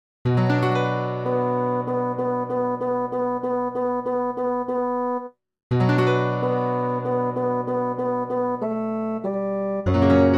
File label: save us savior spanish midi